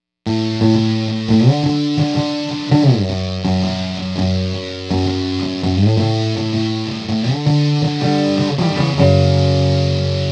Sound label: CRUSH